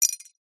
foley bottle beer lid cap metal drop on concrete

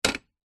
foley metal chair tube small set down concrete

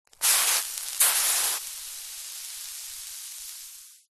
horror acid burn; zapsplat

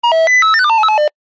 cb radio call ring tone random

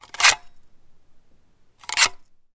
gun mossberg; still north media

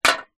drink can empty down on concrete ground

